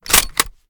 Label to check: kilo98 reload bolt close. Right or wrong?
Right